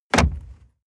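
sports car interior glove compartment close medium; verkehr transport; autos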